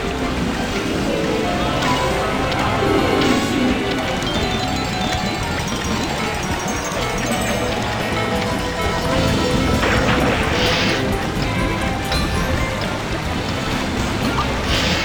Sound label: Wolf Loop; Casino games; Lobster Larry; SlotsOL